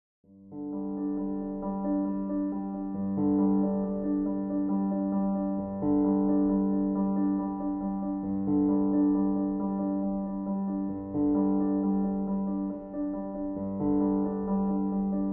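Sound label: pentecost high accompaniment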